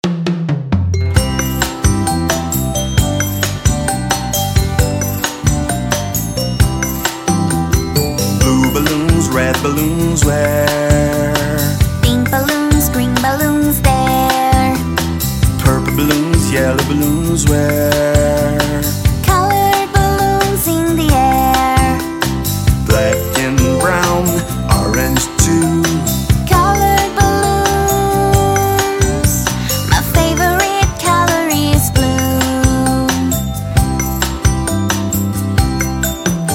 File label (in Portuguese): Canto